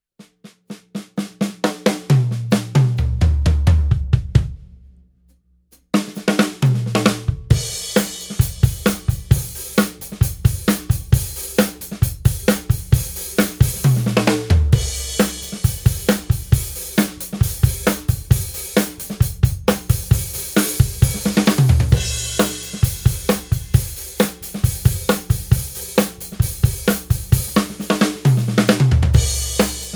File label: Gretsch Renown 57 drumset